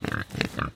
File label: Random Grunz, Pigs; Aporkalypse Pigs of Doom